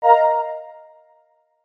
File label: NAVIGATE STAB